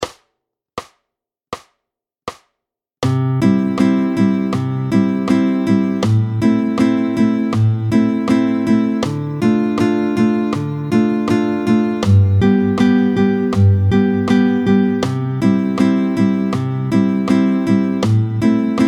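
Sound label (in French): tempo 80 anatole, Un anatole, tempo 80, accords à 3 notes, guitare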